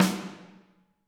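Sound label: snare drum, Drums; Bob Clearmountain Drums I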